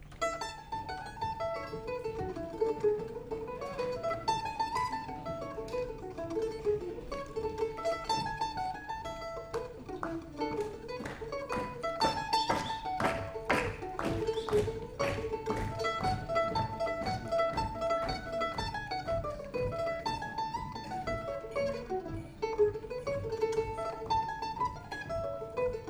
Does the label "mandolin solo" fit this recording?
Yes